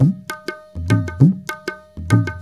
India, Heart of Asia

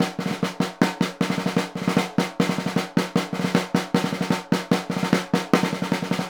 SNR, Synclavier Percussion 2 - World & Orchestral

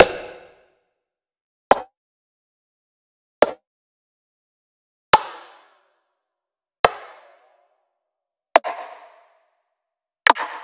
Rims; monosounds

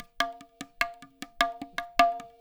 FILL, Percussion

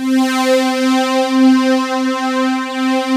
PADS; Pro-Rec - Synthsonic; Z1 PADS

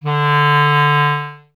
clarinet D, Solo Instruments; Miroslav Vitous - Solo Instruments VOL-1; 90 s Sample CDs

